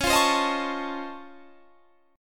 C sharp Augmented Major 9th, C sharp, down, Guitar, Standard; strummed; C#+M9; JGuitar